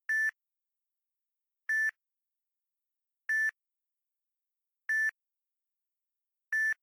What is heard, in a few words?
samsung galaxy s4 beep once ringtone